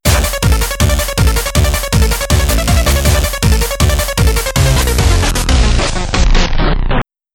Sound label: Ambience - Volcano Plant Panic; Just Shapes & Beats